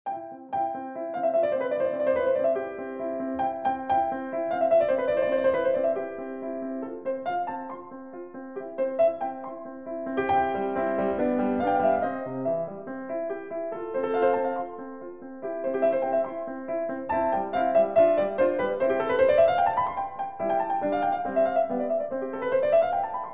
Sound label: Piano Sonata, C Major, Allegro moderato